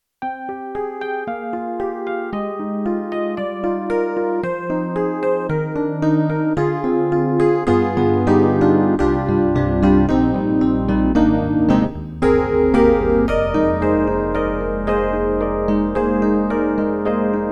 YAMAHA MU1000EX Specter EP

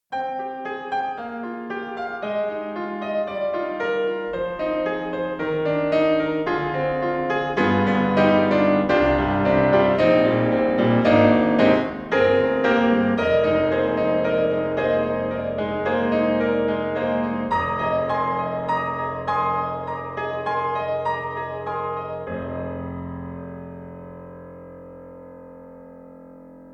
Grands Steinway D Room Mic; Orchestral Tools; The Orchestral Grands

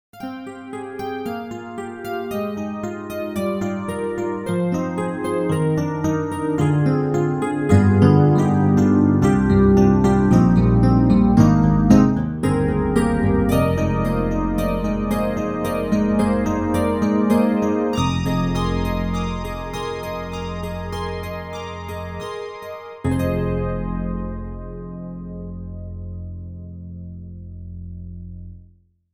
E Piano, free VSTi; 4Front

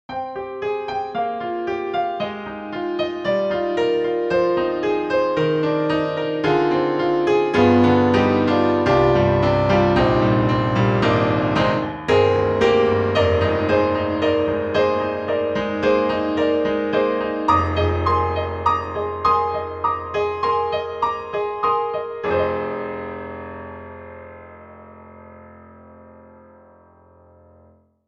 Truepianos Diamond Ballet; 4Front

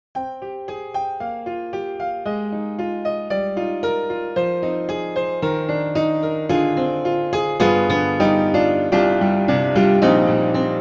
Modern Club Upright; Upright Pianos; Synthogy; Ivory II

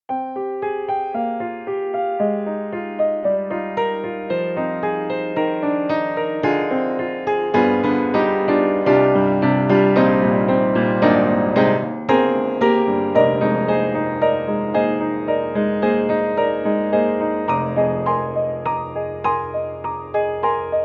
Upright Piano Swing; Steinberg; The Grand 3; Swing Sells

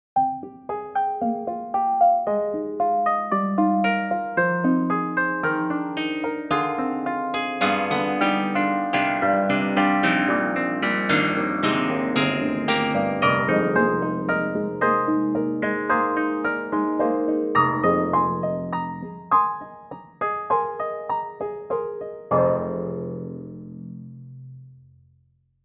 Velo Filter Piano; Cakewalk; DropZone

